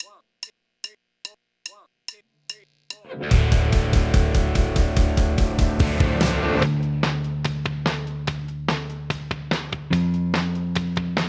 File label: rockin country drop d, backing tracks; rockin country guitar solo lesson